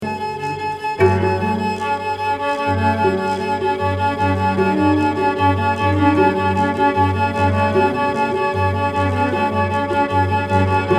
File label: violin